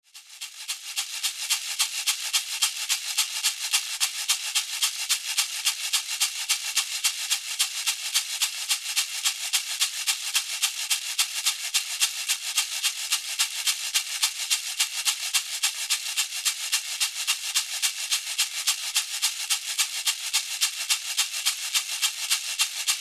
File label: rattle, shamanic music